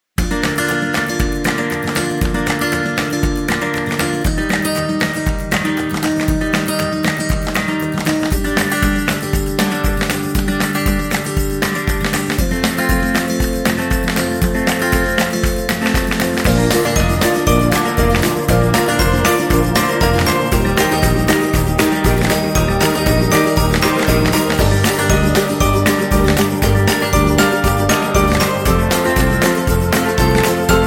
Birthday Music

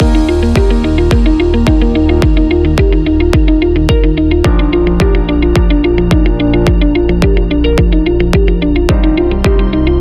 Corporate Loop Upbeat

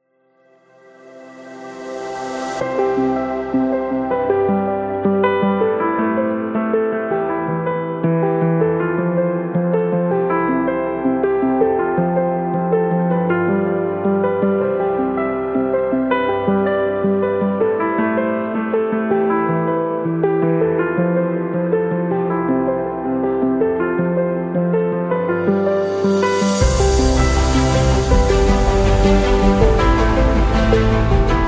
Patriotic

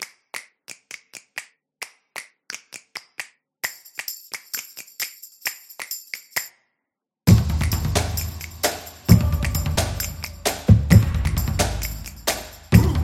Drums Exclusive; percussive nation vol 3

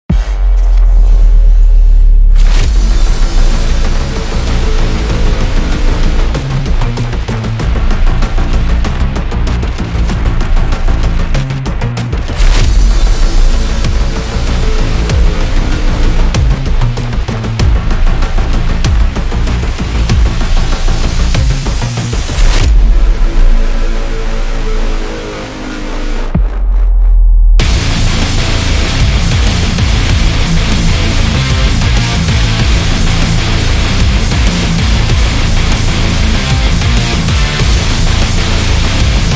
Hybrid Metal

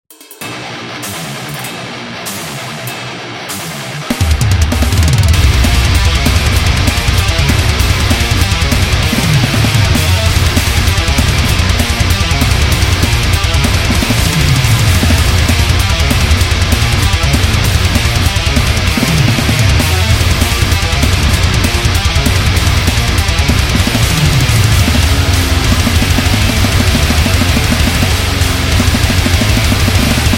Deathcore

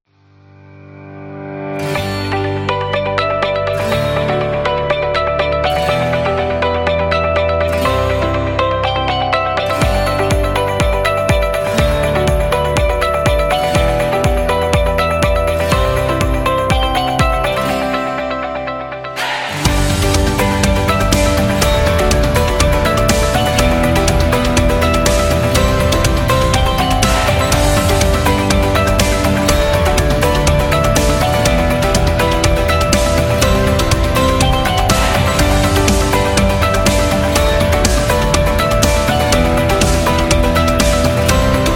Upbeat Corporate

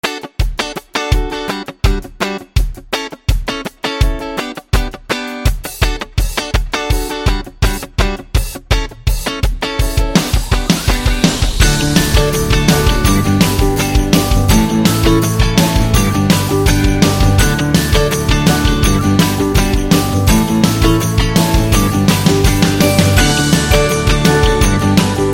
The Happy Indie Rock, happy music; happy music vol 8

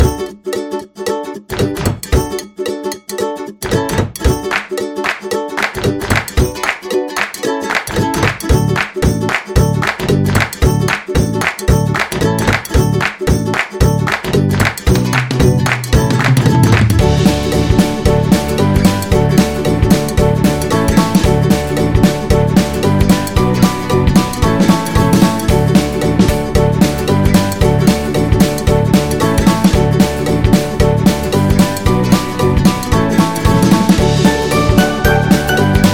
Funny Quirky; birthday music

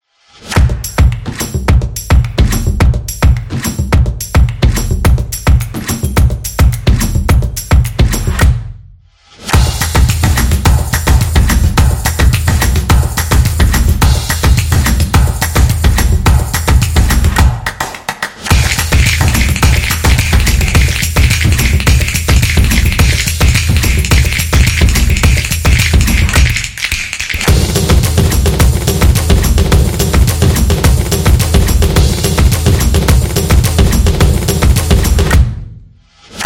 Percussion Fun; casual game

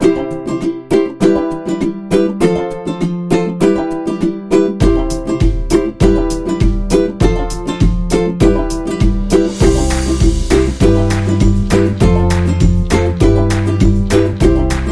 Happy Funny

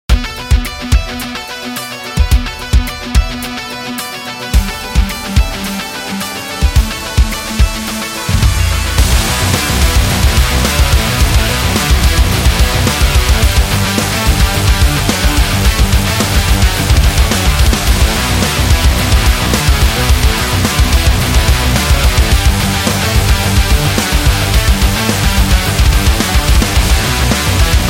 Metal Hit

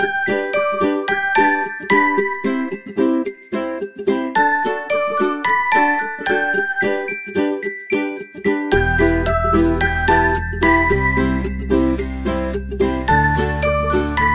Happy Funny

